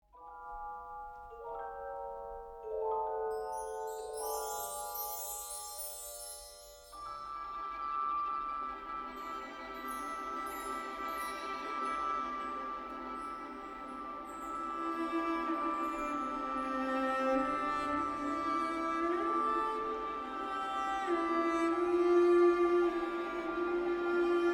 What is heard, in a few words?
magic whims